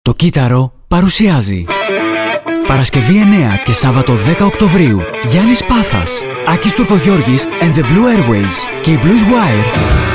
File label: BLUES